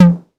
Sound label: 808 TOMS; Wizoo - Platinum 24 Electronic